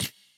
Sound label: wobble1